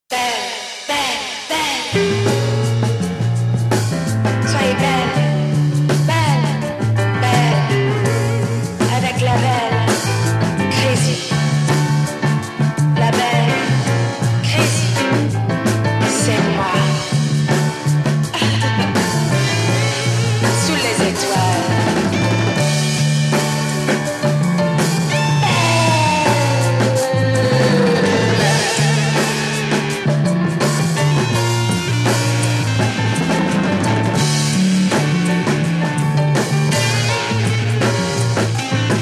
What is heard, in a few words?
vox; KATHMANDOU; Colin-Maillard